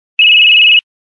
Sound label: Loud Beep once; LG VX11000 enV Touch; ringtone